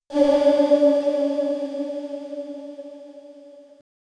scifi heal pickup like a

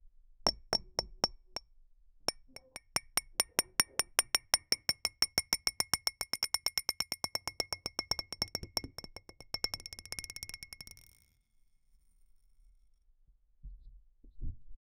bouncing a glass ball off

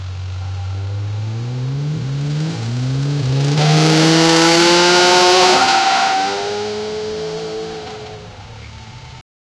the loud aggressive scree, muscle car

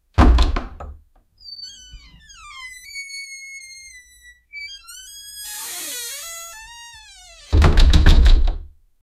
wooden door opening no cr

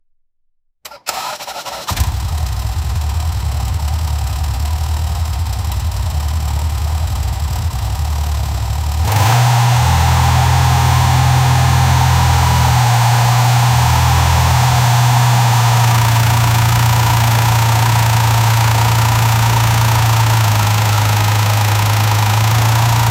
ferrari f8 engine startin, sports cars

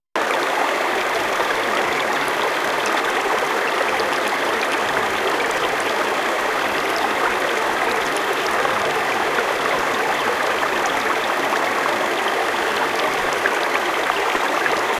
gentle bubbling sound of

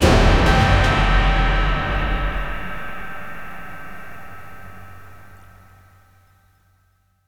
gasp orchestral hit

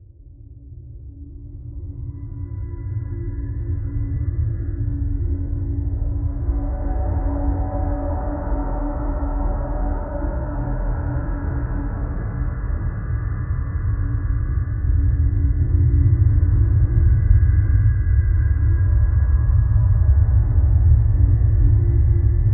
a cold tension filled amb; sound effect

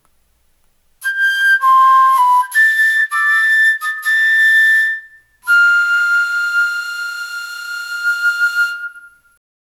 flute music; wind instrument

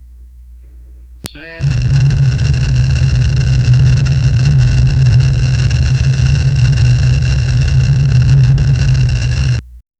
sharp grinding mid to low, handheld

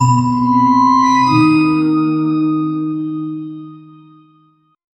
windows startup

